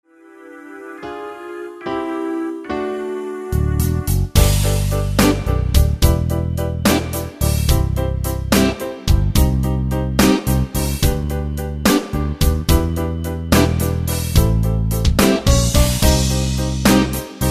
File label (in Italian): Karaoke base musicale